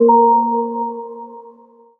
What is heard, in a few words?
bell water A#; Melodies; One Shots; Slate; AURORA Sample Pack